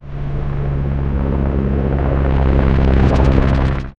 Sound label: helicopter flying low; aircraft